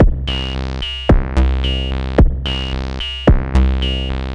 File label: MODU C, Modular Synth Loops